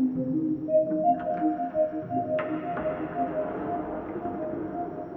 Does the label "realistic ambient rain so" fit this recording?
No